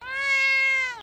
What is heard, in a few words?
meow; thurston